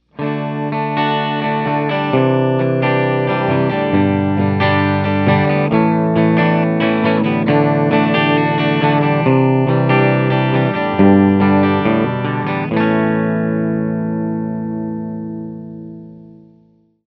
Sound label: clean